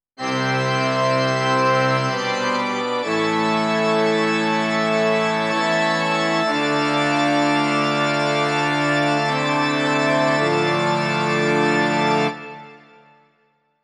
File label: church sample; Clavia Nord Wave